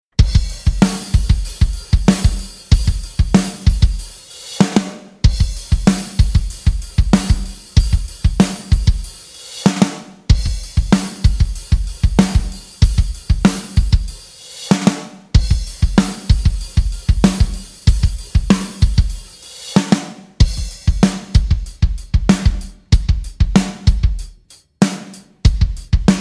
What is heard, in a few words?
Groove